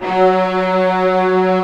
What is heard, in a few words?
STR SEC F#2, STRING SEC